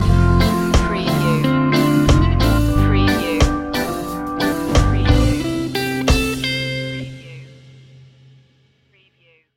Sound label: rock steady stinger